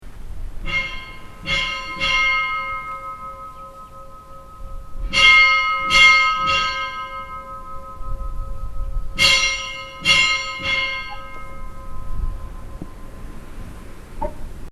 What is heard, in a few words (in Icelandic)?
narfeyrarkirkja 3x3, kirkjuklukkur